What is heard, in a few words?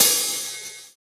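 open hi-hat, Roland MV8800 drum kit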